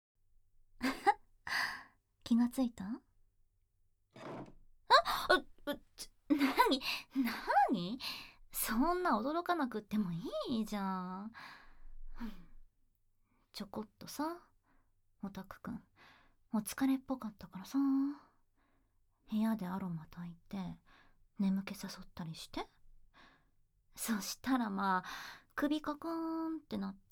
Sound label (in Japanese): ディープキス, ASMR; 脳支配開始(ディープキスでじっくり)